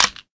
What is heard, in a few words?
tower attack button